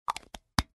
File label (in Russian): Звук закрытия аэрозоля